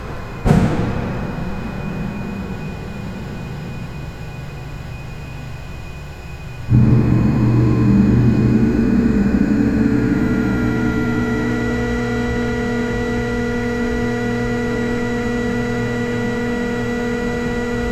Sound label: the sound of a nuclear